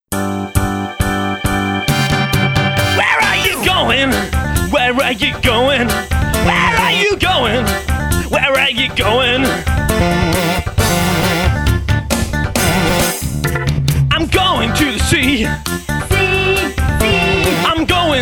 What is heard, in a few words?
where are you going song